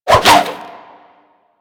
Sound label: Sword And Whip Spin Whoosh; Warframe